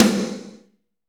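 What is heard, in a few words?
snare, Kit Wet; Northstar - Drumscapes Roland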